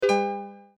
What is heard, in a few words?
Coin Appears; slingo-adventure